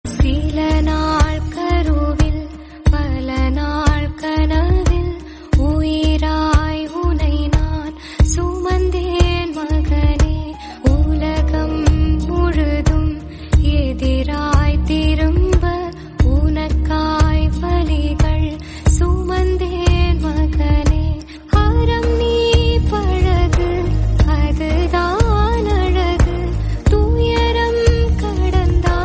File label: Tamil